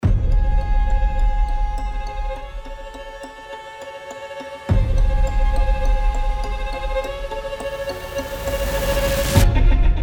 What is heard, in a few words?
Reversal Quirky Strings